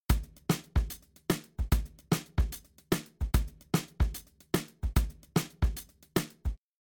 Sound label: Drums, shuffle drums; WDR2